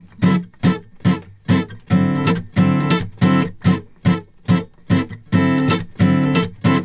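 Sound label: Country Guitar (Chorus Sec 1); Digidesign; Pro Tools Essential Loops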